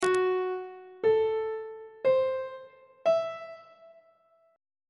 d mixolydian 7th chord 3 is f sharp half diminished 7th on treble clef